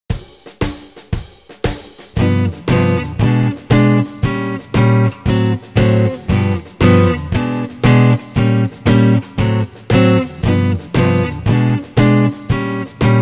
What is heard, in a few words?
Rhythm Guitar